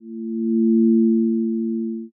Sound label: zero g